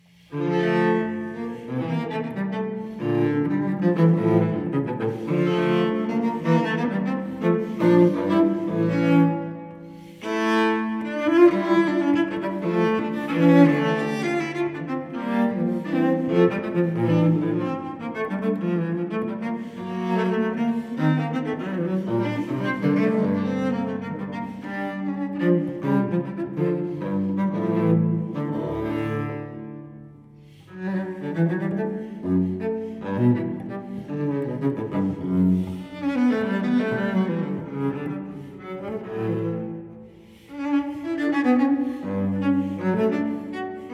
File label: Cello Suite No. 2 in D minor Menuets I II; BWV1008; Johann Sebastian Bach